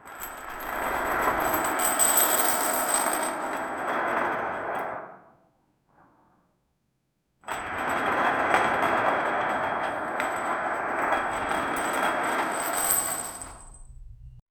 a lot of metallic objects

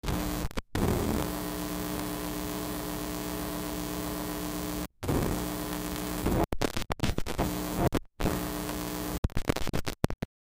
a sudden record scratch that interrupts music

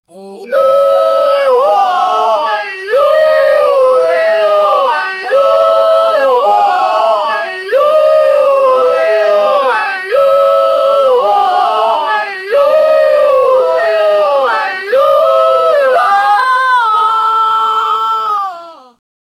goblins chanting

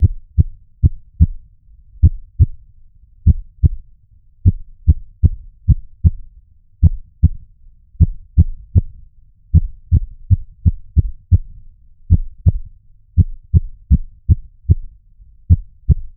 heartbeat sound starts

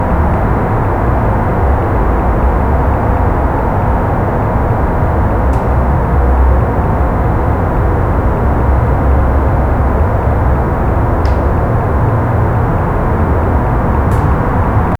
the soft quiet hum of

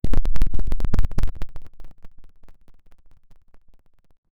zany boing sound as a character jumps

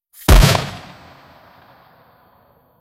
fire ball shoot

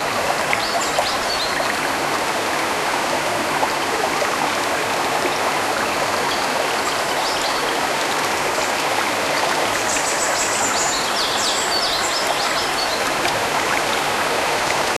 forest with a river and

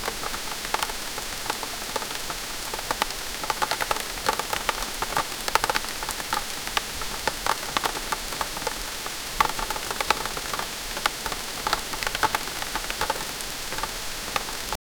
forest raining chilling s

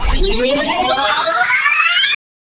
power up sound in heaven

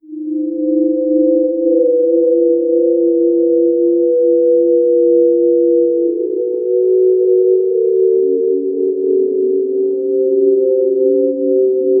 a slow drawn out woooow as if in disbelief

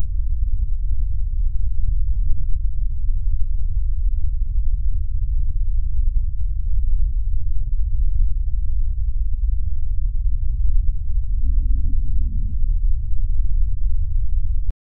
subtle background ambianc

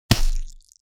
the sound of punching sli

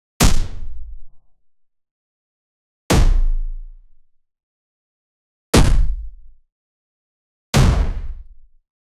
a solid punch landing with a sharp impact

